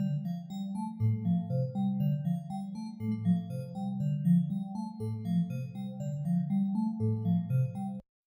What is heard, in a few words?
Phased synth; Phasis; 这种高Feedback高Centre的组合能有效减轻打击乐合成器的低频厚重感。